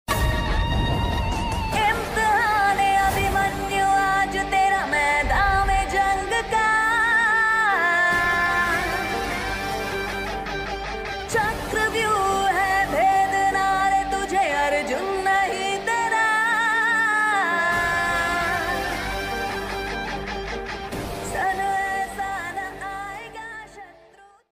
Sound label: a1 express movie ringtone attitudes ringtone, south movie ringtone